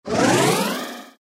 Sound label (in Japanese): 能力向上